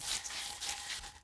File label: shift pole wood